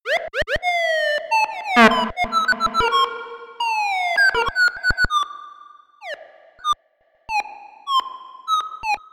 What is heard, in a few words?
Bleep Alert